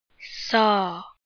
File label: saw